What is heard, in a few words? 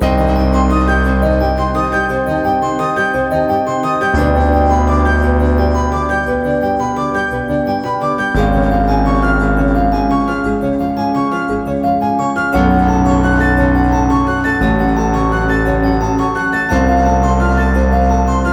Loop 115bpm; Svaior; jenny leclue detectiv